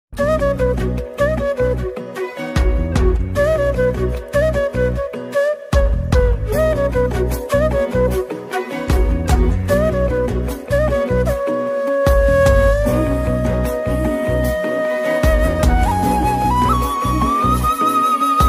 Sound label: movie bgm